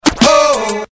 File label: oh oh